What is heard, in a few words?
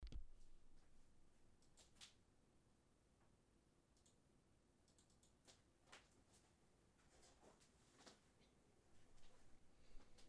bassus; Ateliers chant; Et la fleur vole